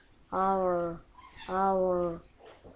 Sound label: owl